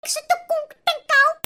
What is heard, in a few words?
CARTOON